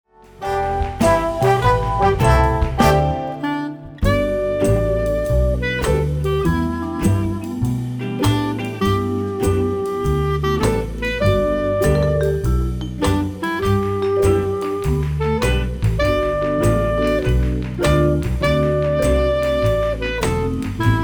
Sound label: Klar